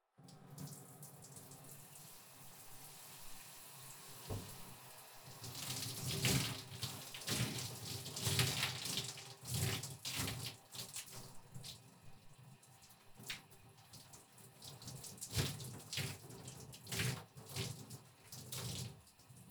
シャワーで体を流す; KU100; お風呂に関する音

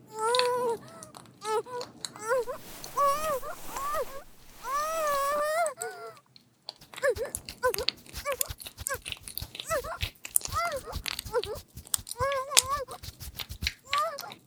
手足縛って口にガムテープ, 効果音あり

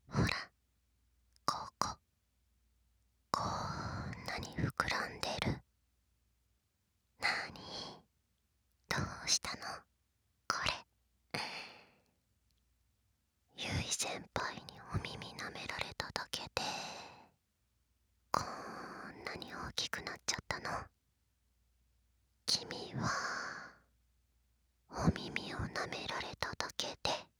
耳舐め手コキ; うしろから耳舐め手コキ

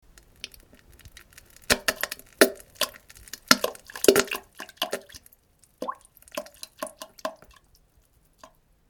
なんべん; むむり（ぼた）むちちっ（ぼたたっ） （ぼっ た たたたっ た）約8秒

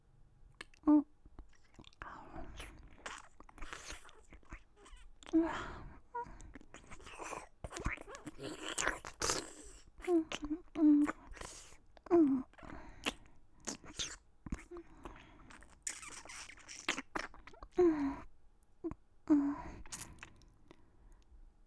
甘噛み; 耳洗2

